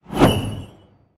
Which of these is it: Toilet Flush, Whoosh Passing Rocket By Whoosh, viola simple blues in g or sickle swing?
sickle swing